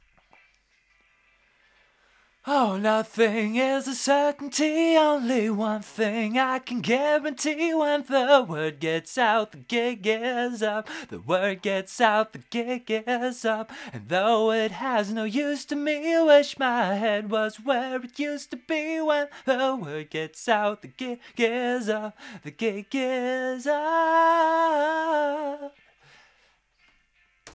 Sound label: vox; logic